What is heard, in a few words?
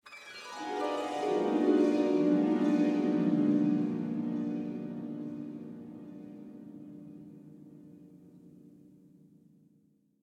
harp